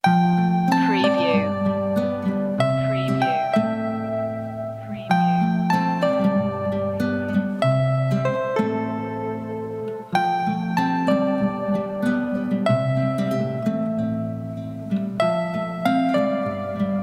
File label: dreamy bells